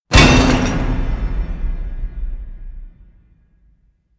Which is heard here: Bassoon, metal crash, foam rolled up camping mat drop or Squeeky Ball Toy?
metal crash